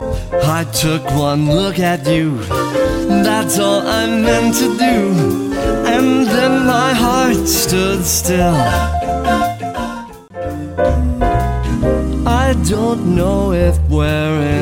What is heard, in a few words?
vibe small ensemble